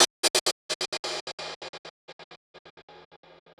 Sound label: Gated Cymbal; musicradar